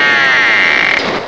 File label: whale